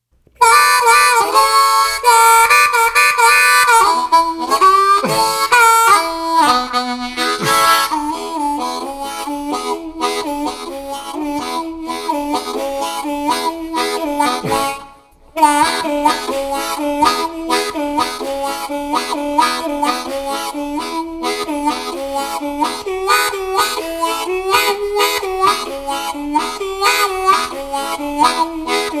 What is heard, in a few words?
Harp 125